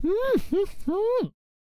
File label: Chariot Attack Vocals